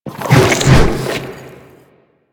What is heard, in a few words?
Infested Ancient Strike Flesh; Warframe